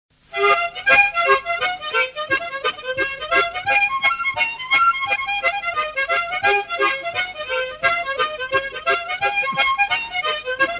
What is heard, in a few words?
Hornpipe; TheWexfordHornpipe